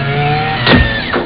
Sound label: CANON; Flashback